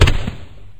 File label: boom, fireworks